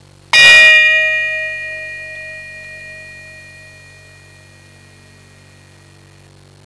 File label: LO SWISS, Bells